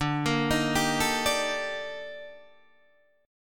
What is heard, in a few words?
D sharp Major Sharp 11th, D